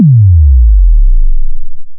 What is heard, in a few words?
kick